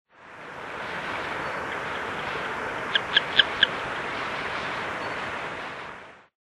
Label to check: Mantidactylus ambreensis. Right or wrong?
Right